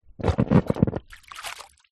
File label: leisure inflated beach ball pick up out of water